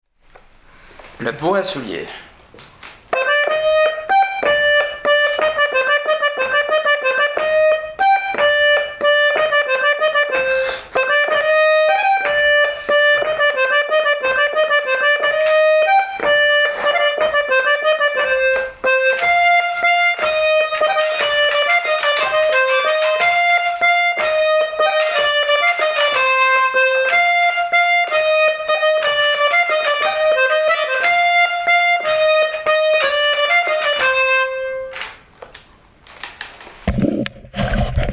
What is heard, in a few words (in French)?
Bourrée à soulié